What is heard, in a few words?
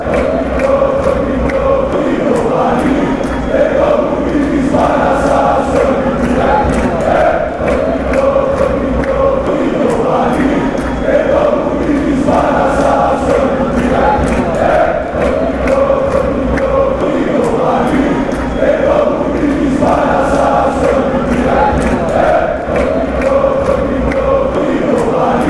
panathinaikos chant